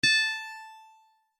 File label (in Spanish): Medalla Evoca; Pokemon Essentials; Pokemon Opalo